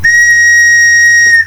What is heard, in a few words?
whistle, keychain whistle; mechanics